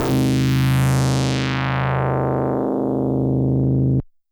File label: DX100 C3; rocket